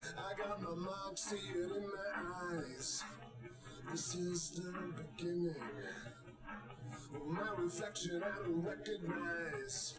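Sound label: with drums, live rec; SiSEC11; underdetermined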